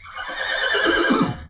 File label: welcome back Whinny